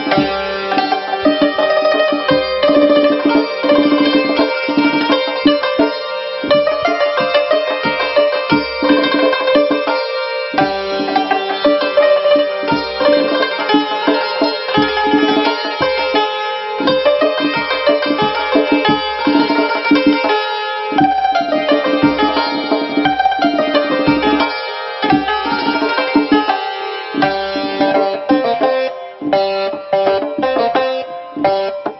Santur

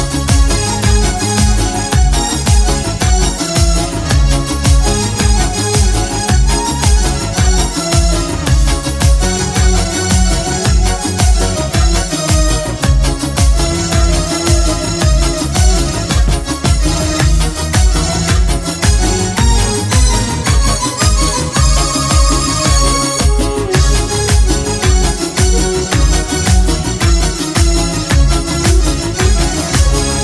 Melody

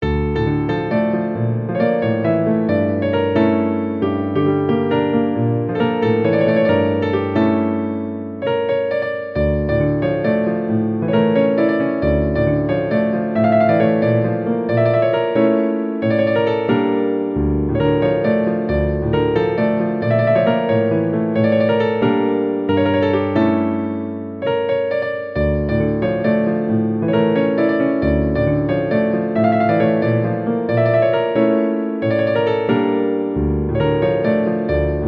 Piano

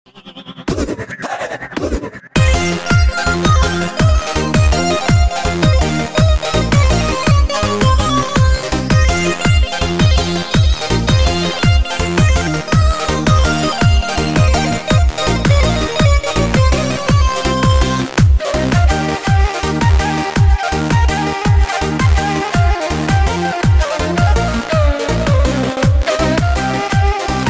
Melody

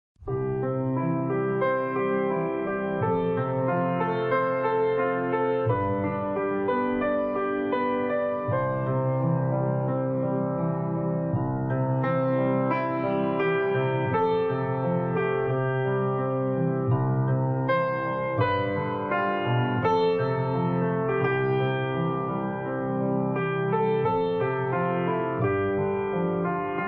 Piano

